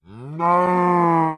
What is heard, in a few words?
cow moo long